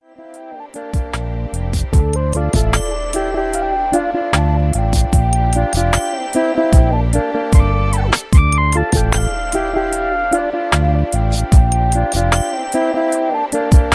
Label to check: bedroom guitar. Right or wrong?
Right